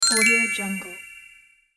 level complete

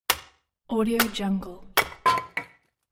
impacts axe wood chopping

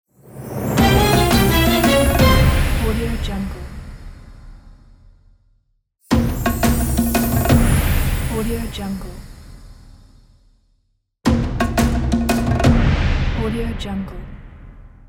egypt quest complete

